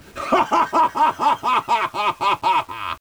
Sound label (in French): rire; eggman